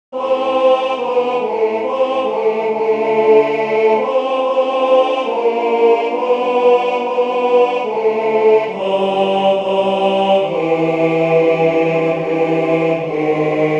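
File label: baritone, barbershop tag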